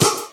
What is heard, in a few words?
Percussion